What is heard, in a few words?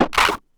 Percussive Hit, contact mic